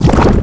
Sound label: Arm Submerge; Vaati's arm submerging; Vaati's Wrath